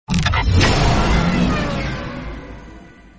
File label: notification; asphalt 9